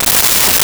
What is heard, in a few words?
Arrow Movement Fast; Weapons & Explosions